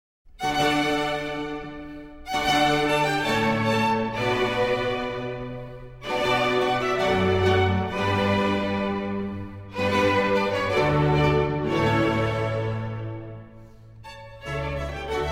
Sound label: Violin Concerto in D Major, Allegro